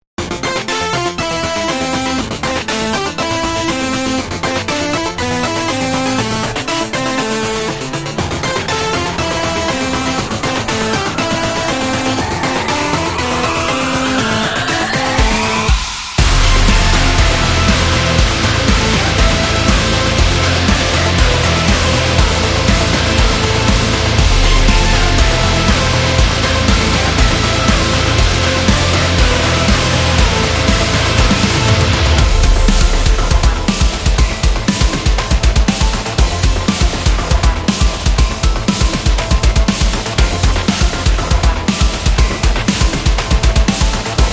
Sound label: Game Over